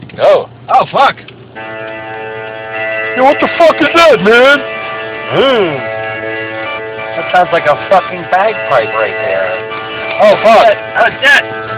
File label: Fancy Bagpipe